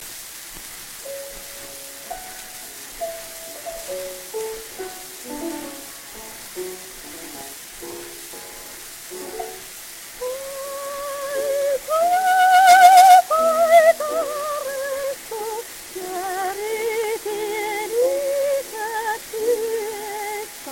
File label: Gramophone